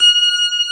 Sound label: B3 G 4, ORGAN; VOCAL ORGAN